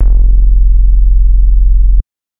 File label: Synth oneshot Long F, Synth Bass One Shots; Slate Digital BASSHOLE Sample Pack